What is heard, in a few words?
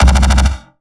Synths Root C